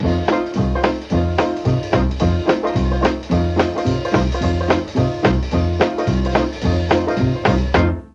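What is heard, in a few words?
A2 dixie trombone, mellotron; DIXIE+TRMBN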